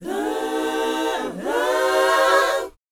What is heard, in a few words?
BOYZ F, Vocal Planet, R&B Dance; Spectrasonics Vocal Planet